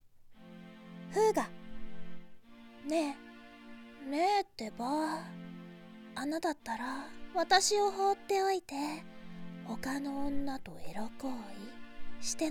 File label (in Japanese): アエギ声ループ; フーガ